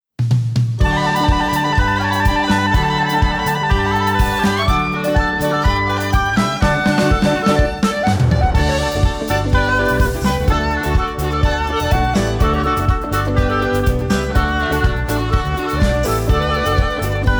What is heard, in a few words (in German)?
Kookabura Karaoke